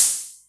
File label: HatO, Roland MC09; Drums